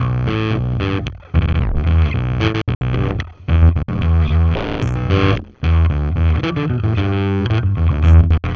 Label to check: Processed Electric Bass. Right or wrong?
Right